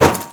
pipe lead heavy; melee weapons; tfa starwars melee weapons pack

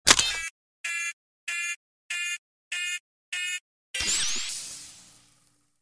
overheat; gmod; lfs luna s flight school; laatc atte

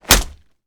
punch gun wall; gmod; arccw uc commons attachments

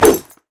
wrench swing; melee weapons; tfa starwars melee weapons pack

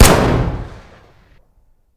fire dist int pistol; gmod; arccw uc commons attachments

